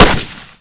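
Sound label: machine gun; svencoop; paranoia; diversant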